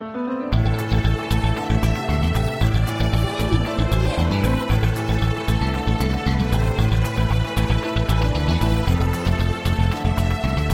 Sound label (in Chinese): 场景关卡背景音乐